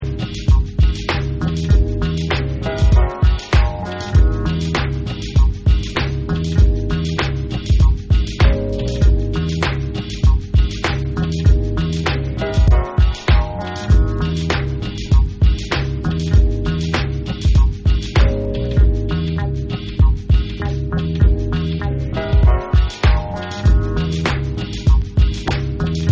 Instrumental

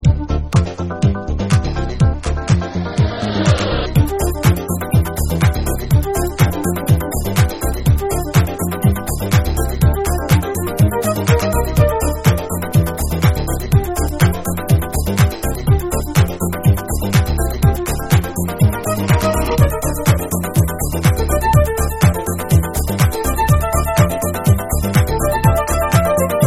FLUTE HOUSE

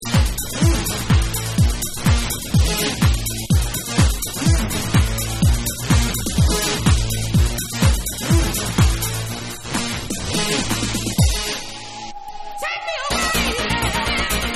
Techno Mix

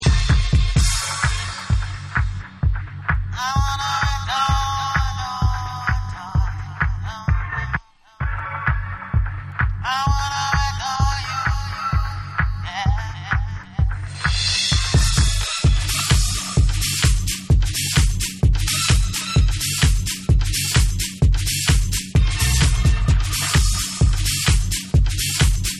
Instrumental Mix

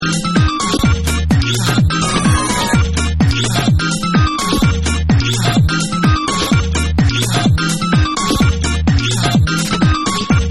Brazilian Electronica Mix

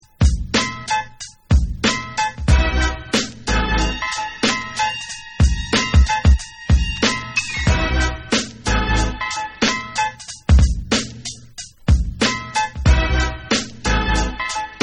Instrumental

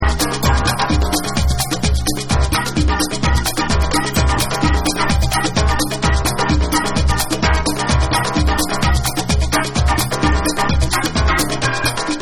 Tribal Winds

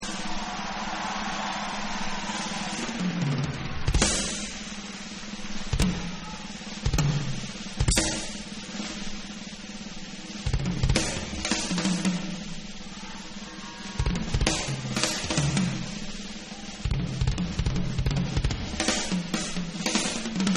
Drums Solo

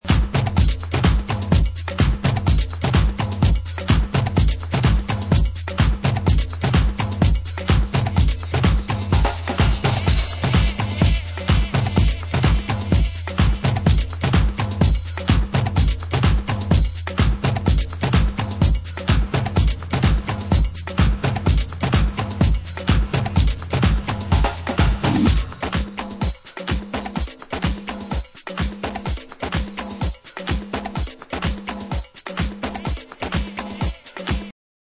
Beats

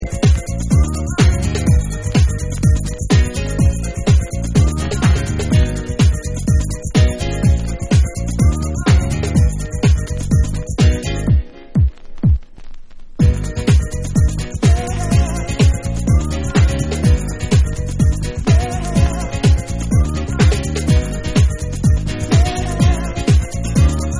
Instrumental Version

